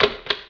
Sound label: button; HEDZReloaded